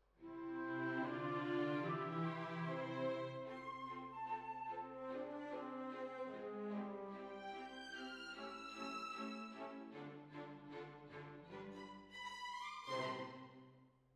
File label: Hall, Jazz for piano